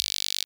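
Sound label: SR95 guiro; Best Service; Dance Mega Drums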